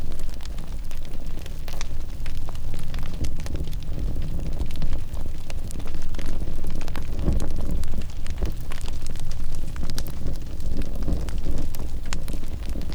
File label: zone fire loop